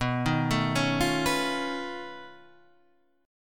B 9th Flat 5th B down Guitar Drop D

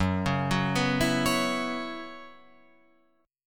Gb 7th Suspended 4th, Guitar Open F; Gb7sus4 arpeggiated

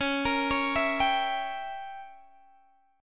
Db Diminished 7th, Guitar, Open G

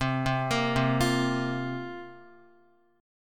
C 7th Suspended 4th, Guitar, chord

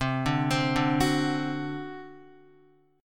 C Suspended 2nd Flat 5th C down Guitar; Csus2b5 arpeggiated

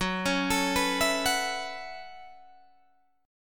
Gb Minor 11th Gb Guitar Open D

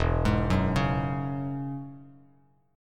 E 6th Add 9th E down Bass Standard; E6add9 arpeggiated; JGuitar

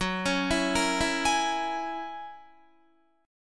Gb 9th Gb down Guitar 4E,4A,5D,5G,5C,6F; Gb9 arpeggiated

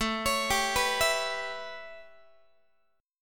A 9th, down, Guitar; arpeggiated; JGuitar

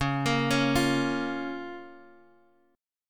C sharp 6th, Guitar, DADGAD; down; chord shape

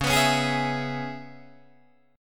Db Minor 7th Db down Guitar DADGAD; strummed

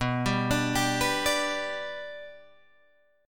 B-Minor Sharp 5th, Guitar, Open G

